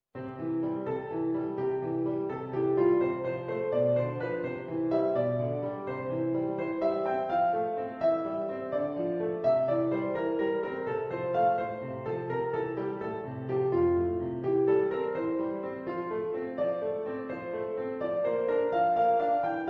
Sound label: sonatina F min